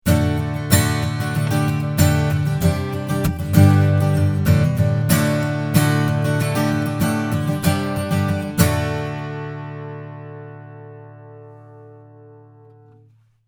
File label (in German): Schallloch